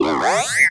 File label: Toaster; rabbids multiverse; iOS, macOS, Mobile